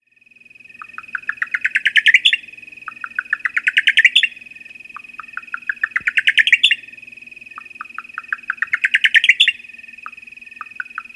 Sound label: Buff collared Nightjar